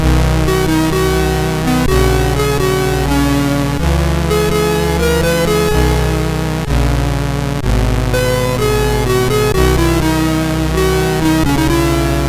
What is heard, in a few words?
Advert, MOS6581R4